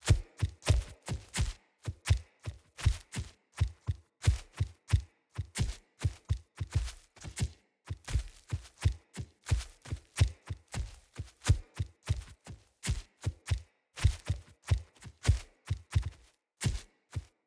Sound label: Wolf Steps Walk Loop; Animals; Footsteps